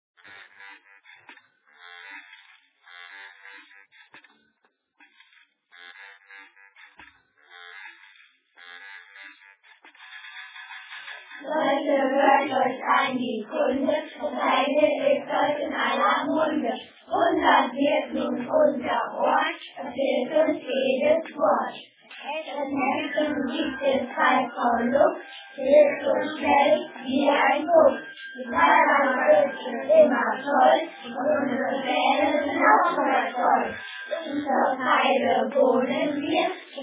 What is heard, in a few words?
rap